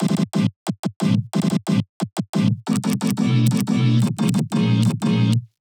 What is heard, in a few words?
vocoder